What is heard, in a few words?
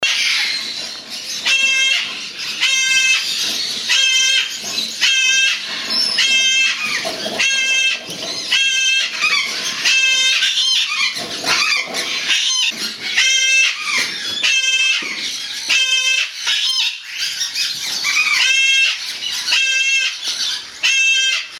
Ducorps Cockatoo, Parrot Calls